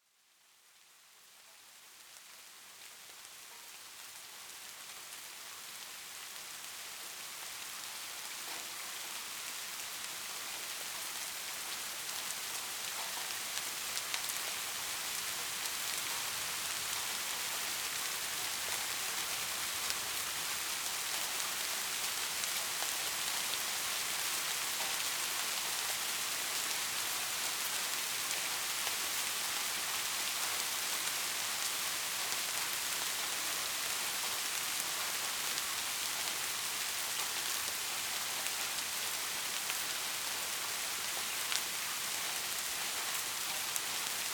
rain, weather; ambient

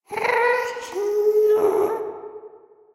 moan, ghast

